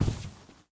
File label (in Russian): korotkij zvonkij chyotkij zvuk vistrela iz tanka